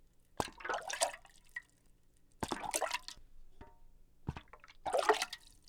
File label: Dunking Cup in Water